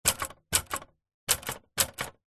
office typewriter buttons keys presses typebar strikes slow x4